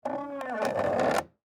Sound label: Creak Plastic Stress, Texture, Creak, Plastic, Stress; Creaking Sound Effects; Objects